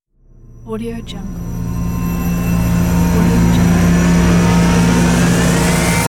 rising tension soundscape with dead stop

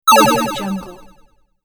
vintage video game over

